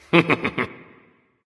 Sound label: "heh heh"; smite; voicepack; ullr